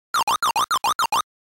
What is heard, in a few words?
walk